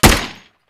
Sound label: base fire; RvlSDK